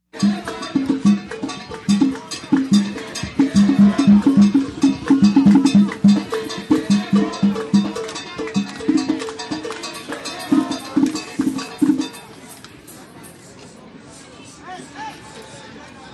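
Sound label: Central Park Festival Drums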